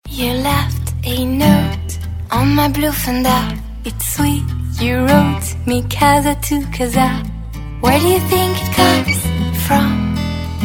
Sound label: ringtone